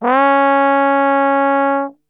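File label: C#3 trombone gliss, Brass; Best Service; Brass Super Section; TRMB GLISS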